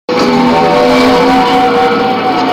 Car; Star Wars II

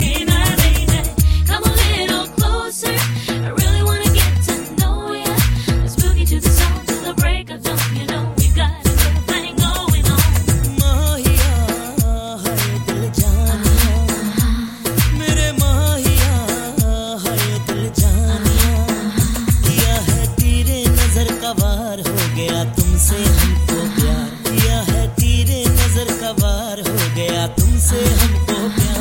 Hindi Remix

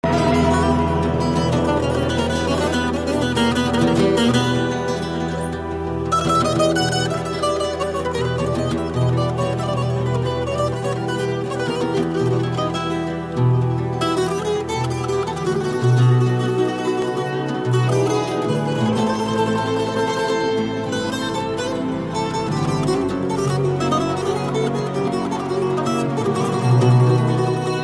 Sevdah Metal

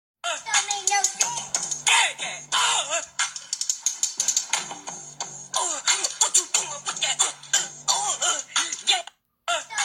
Ah eh

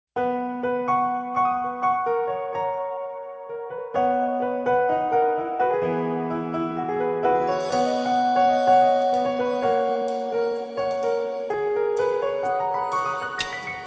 Thoda Thoda Piano

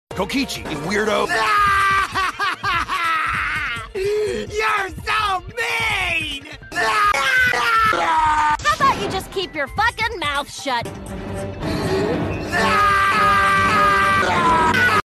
fake crying; Kokichi

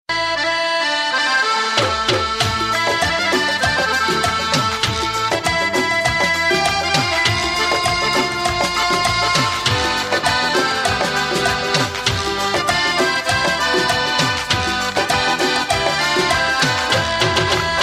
Music Tone

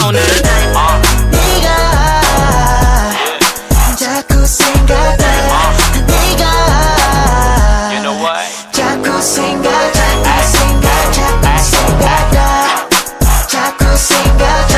Korean hip hop

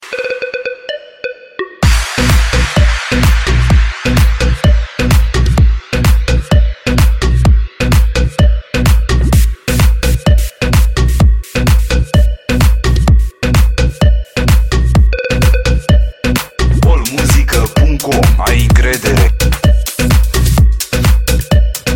Beat Nice

